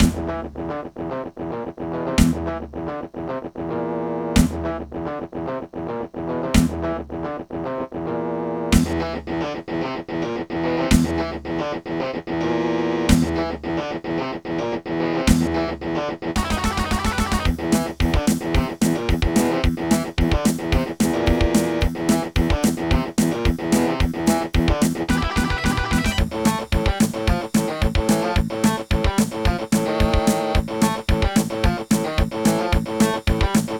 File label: Logitech SoundMan Wave